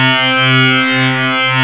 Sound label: FM PLUK